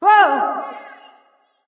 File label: fear light; smite; voicepack; hel